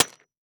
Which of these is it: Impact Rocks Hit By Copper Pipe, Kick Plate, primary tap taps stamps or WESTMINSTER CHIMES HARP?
Impact Rocks Hit By Copper Pipe